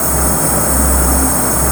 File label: WATER BELLS; Q Up Arts; Dream Experience; CLUSTER